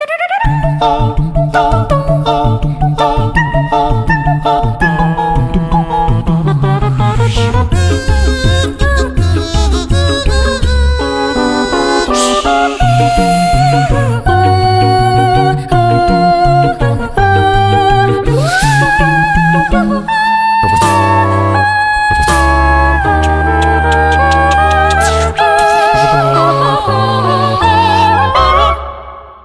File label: Acapella; Dexters Laboratory